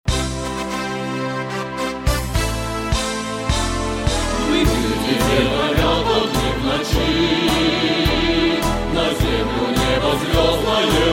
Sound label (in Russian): Gimn KUZBASSA korotkiy hor